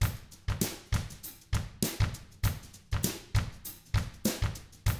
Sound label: FUNKY, Drums